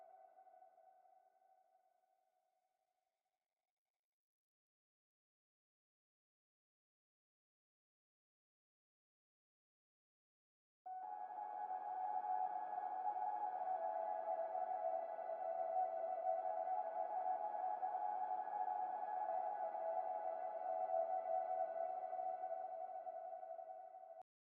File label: Atmosphere